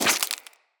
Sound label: Root Spider Foley Leg Swipe; Animals